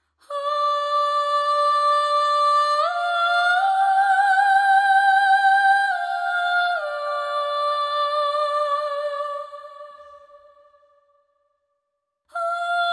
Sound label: Solo Voice